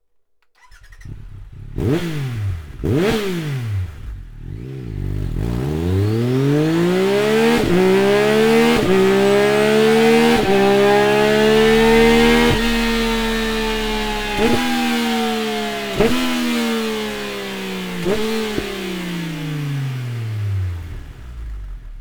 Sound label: Slip-On